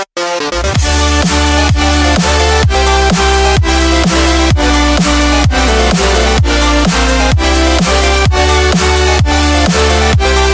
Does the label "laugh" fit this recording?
No